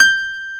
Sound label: PIANO G5; POP PIANO; AKAI S6000 CD-ROM - Volume 1